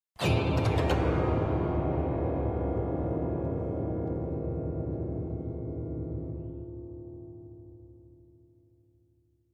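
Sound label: Grand Piano Strings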